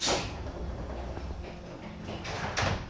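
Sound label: Door Close, Train; 103KCJ LowerCab; OpenBVE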